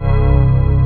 SFX, FILM SCORE; AKAI S6000 CD-ROM - Volume 1

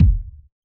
hip hop kit kick

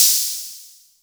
HH-Analog O, Korg Radias

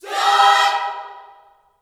JOY CHORD, Gospel, Vocal; ILIO; Vocal Planet

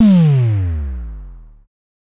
DSM2 Sub; MPC DSM-2

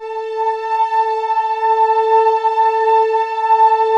PROPHET VS, Vintage Keyboards

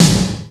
GATED SNARE, MPC-60 DRUMS; Greytsounds VOL-1

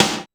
Dance Kit, Korg 01W

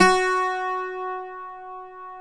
BOUZOUKI F#3; AKAI S6000 CD-ROM - WorldSounds

